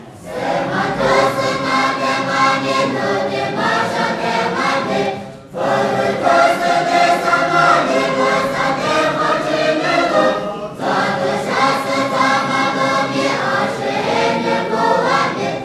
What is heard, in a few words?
Lisu hymn; fake roll called up yonder shamu